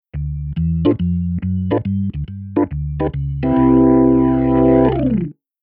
Keyboard Organ Drawbar

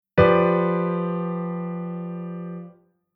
Chord Hybrid; Jissen

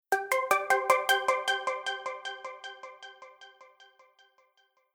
Reverb Delay Pluck Delay On; Mix Insert

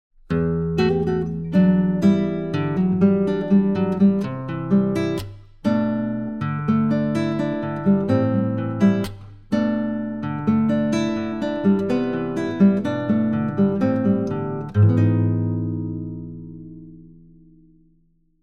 guitar Gut G

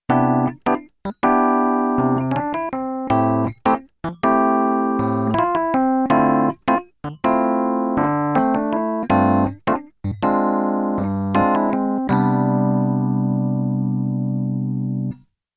Keyboard Pf Pops Pf

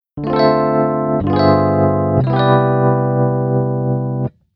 Keyboard EP FX

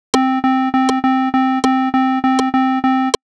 Rhythm Note

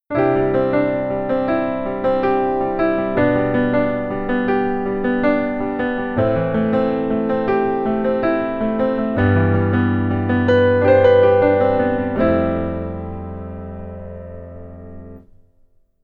keyboard

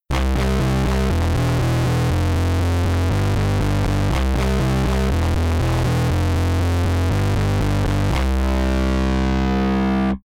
bass effector fuzz

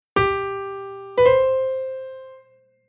grace note

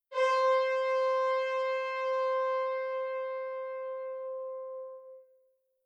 Horn

